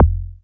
MINIM BD; DANCE KIT

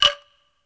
PERC, ETHNIC DRUMS; Crypton - DrumBase5000

